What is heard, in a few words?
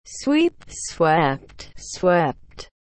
sweep